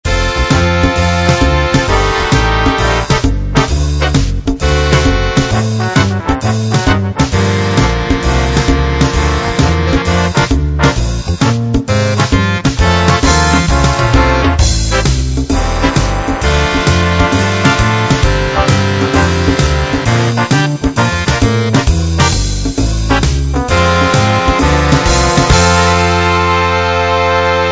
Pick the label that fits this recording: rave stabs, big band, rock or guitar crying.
big band